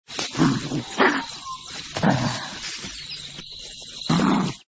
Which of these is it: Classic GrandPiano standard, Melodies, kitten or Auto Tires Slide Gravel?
kitten